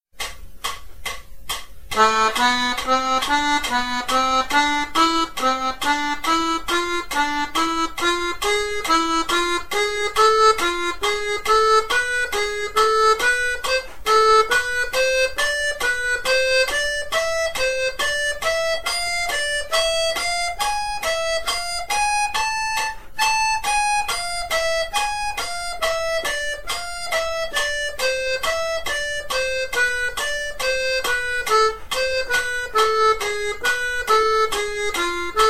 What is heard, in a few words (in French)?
gamme la m harmonique par 3